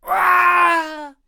Ice Archer Death Vocals

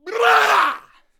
Shogun Attack Vocals

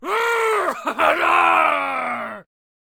Headbutter Attack Vocals

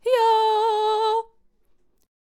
Celestial Aegis Attack Vocals

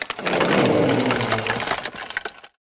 Skeleton Warrior Death Vocals

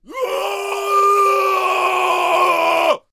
Jarl Attack Vocals

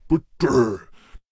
Zeus Attack Vocals

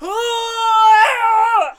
Musketeer Attack Vocals

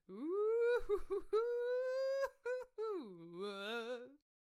Candlehead Attack Vocals

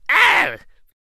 Death Vocals

